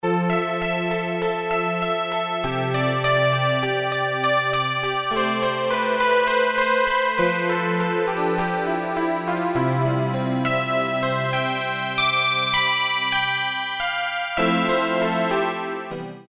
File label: GLASS